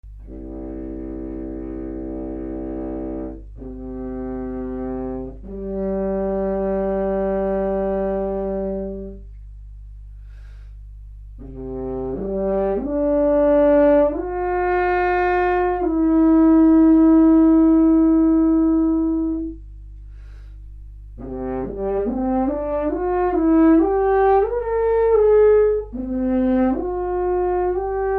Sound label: horn